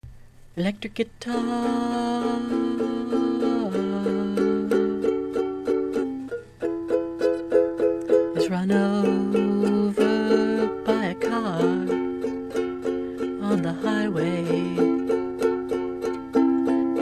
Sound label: electric guitar